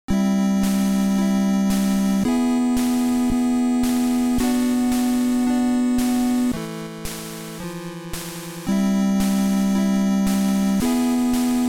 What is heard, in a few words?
loop, chipmusic